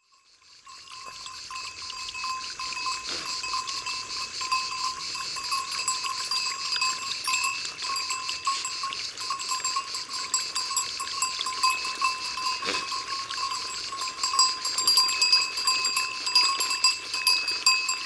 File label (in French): Petit troupeau de chevres, Ardeche; Ribes; Ambiances Sonores d'Ardeche; Studio Les Trois Becs